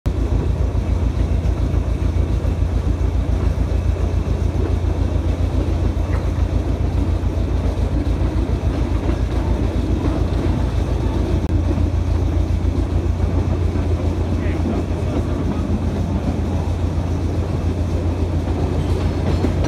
Fahrt durch Bf.; Fahrt durch Bf. Siebenbürgen und entlang ehemaliger Bremsbergstation

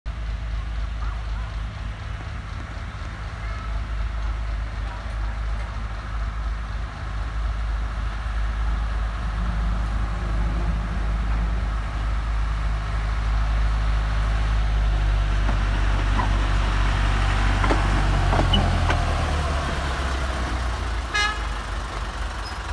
Lok umsetzen und Ausfahrt; Lok umsetzen und Ausfahrt Nordstecke; öffentliche Fahrtage; Fahrbetrieb

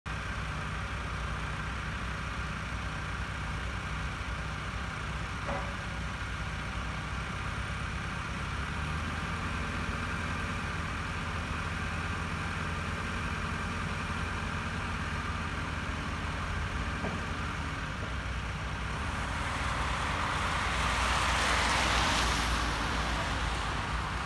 Gleise Aufladen Bauzug; Nordstrecke

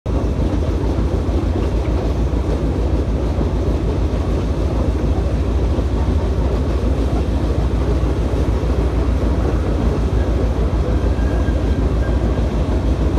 Weiterfahrt Anstieg S Kurven

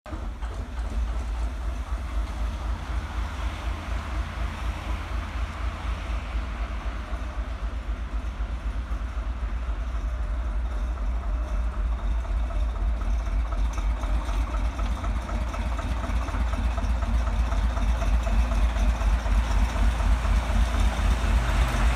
Feldbahn ausfahrt Lokschuppen; NDR

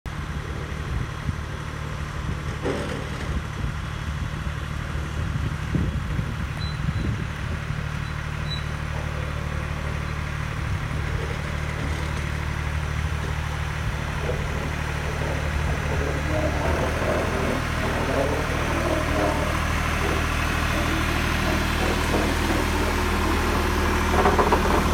Kurvenfahrt

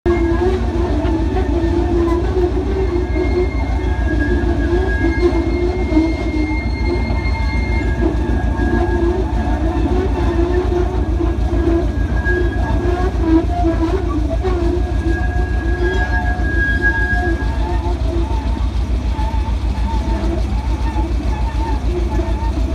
Fahrt durch die Gabionen

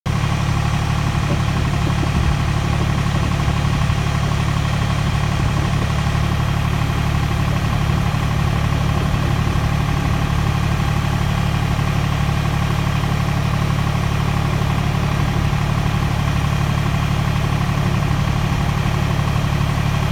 Fahrt Tragplatte

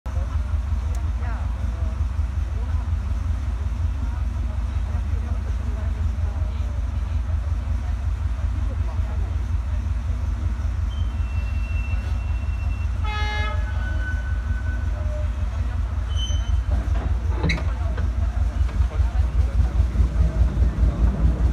Rückfahrt ab Bf. am Stüveschacht

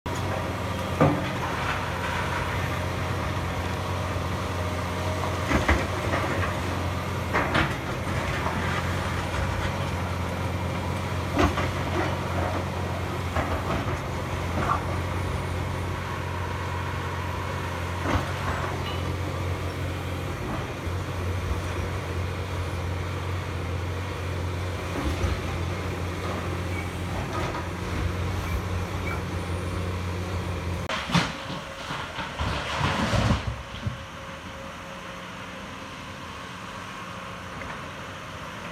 Bau Nord Bagger und LKW; Bau02 Nord Bagger und LKW kurz vor Bhf. Siebenbürgen

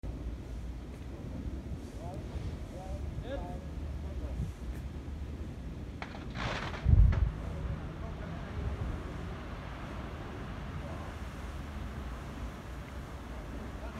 Sprengung Felsrippe; NDR

